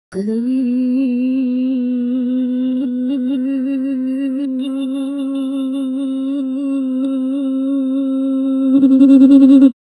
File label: short repetitive sounds